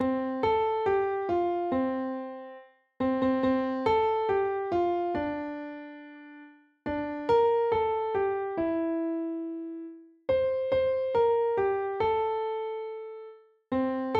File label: Jingle Bells (F Major)